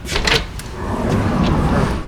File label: open; Quicken